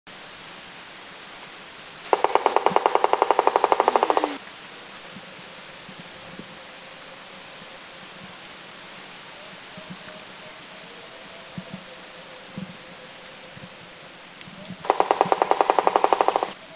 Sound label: White-bellied Woodpecker Drum; Philippines